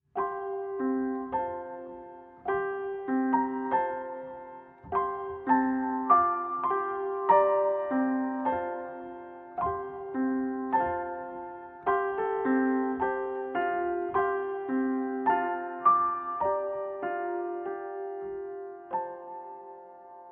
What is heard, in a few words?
tune